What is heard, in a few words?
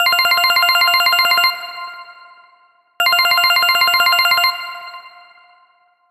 Ring, ringtones